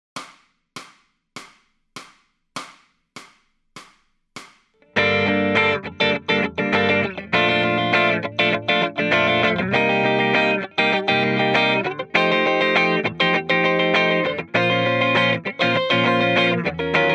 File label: Lead Backing Track, electric guitar